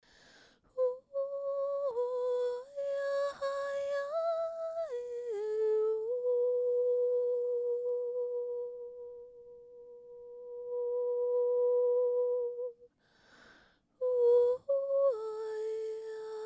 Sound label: toning